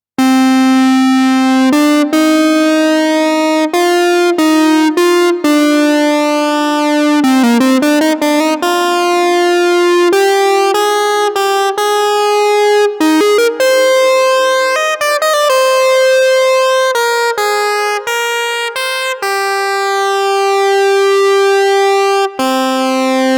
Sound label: lead 1 vco pulse pwm